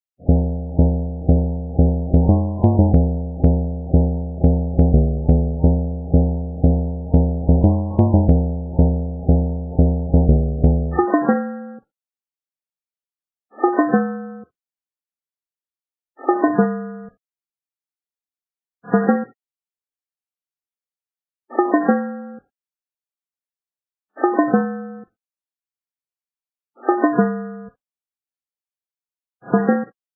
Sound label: take a look tubes